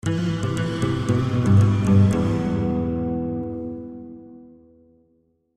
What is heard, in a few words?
guitar wet cathedral